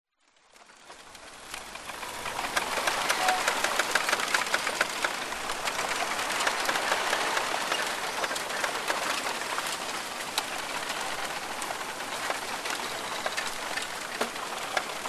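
nature forest bamboo tree blow creak in wind birds in background australia; zapsplat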